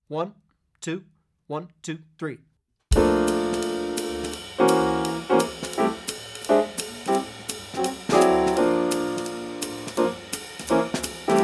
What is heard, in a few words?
bass without soloist